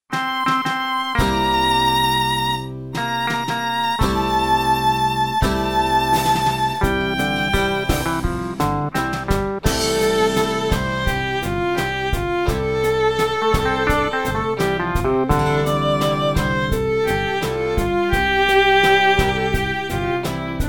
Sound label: F major violin